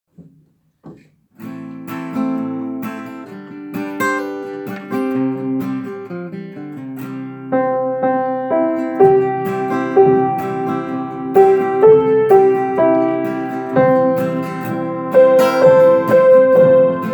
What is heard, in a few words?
Soprano Piano